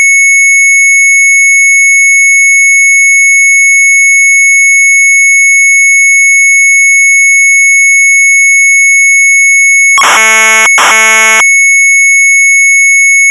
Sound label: MZ800 Tape; retromelsarchive; sharp mz800